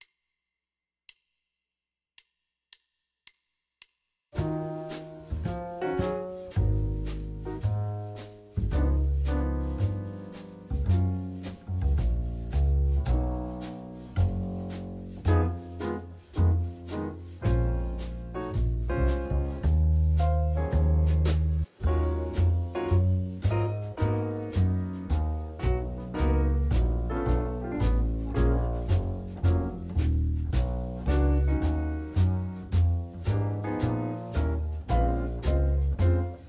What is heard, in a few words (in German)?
vollkadenz alto, saxophone